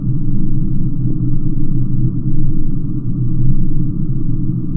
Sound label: Cave Drone Scary Loop; horror and atmosphere fx; Silent Hill Downpour